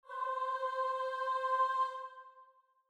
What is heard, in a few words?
starting note, voice; try to amuse me